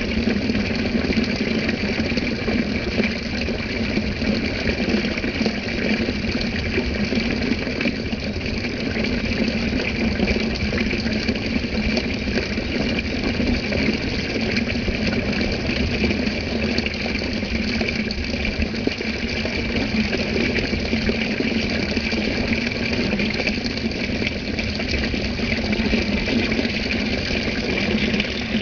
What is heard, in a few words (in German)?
Brunnen obermeitingen; Landsberg